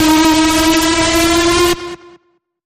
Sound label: Bend, D sharp; Feel The Bend